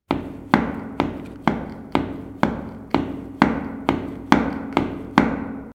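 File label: stomping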